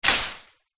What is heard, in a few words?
bushes leaves hit with wood post; foley